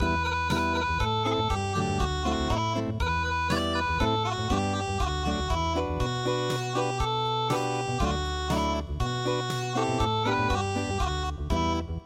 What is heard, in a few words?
Unplugged